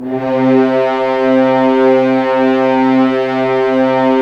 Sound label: Horns; Roland - LA Composer vol. 4